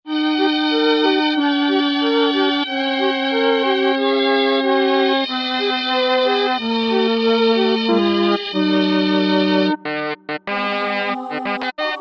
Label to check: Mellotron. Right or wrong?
Right